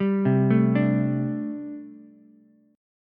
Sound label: A Minor 11th A Ukulele C6, Chord; Am11 arpeggiated; 0,0,5,5; JGuitar